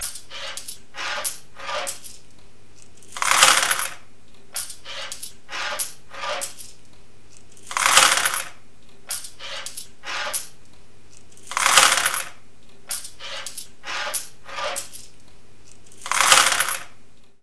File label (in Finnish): kolikot